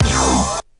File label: nonmusical, stabs